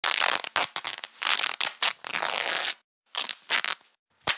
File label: bandaging; dod1; player